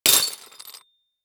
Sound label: Impact Ceramic Debris Hit By Medium Metal Pole; Impacts